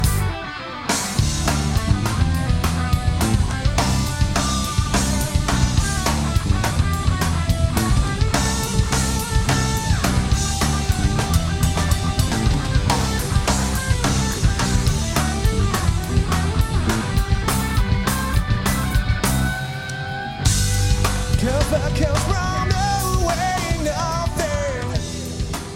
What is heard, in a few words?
solo